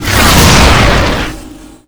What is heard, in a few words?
scorch; SPELLS